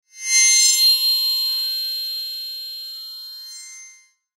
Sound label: Logo Metallic Ascend Swell; Sonic Logo Sound Effects; Production Element Imaging Element Accent Transition